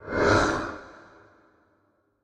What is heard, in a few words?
fire; smite; hades